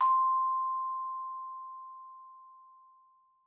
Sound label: Vibes hard C5, Vibraphone, Hard Mallets; Struck Idiophones